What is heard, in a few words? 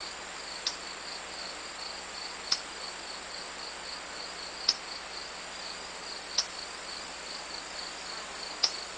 bird